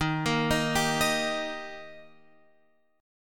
Eb Major Eb down Guitar Open F; arpeggiated; JGuitar

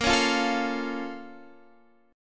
Bb Minor Major 7th down Guitar Standard, chord; BbmM7; strummed; JGuitar

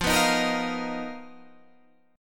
B Major 7th Flat 5th F, chord shape

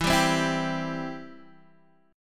E-Minor Sharp 5th, Guitar, Open D

